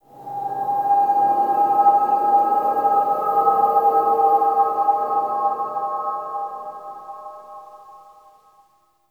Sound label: Factory Texture, Ambience; Zariman; Warframe; The New War